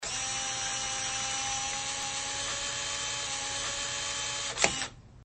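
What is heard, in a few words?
peremotka plenki fotoapar, camera film rewinding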